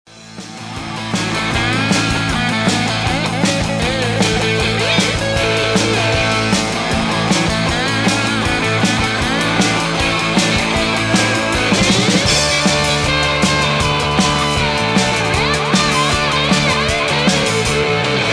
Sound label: solo